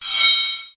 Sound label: Metal Impact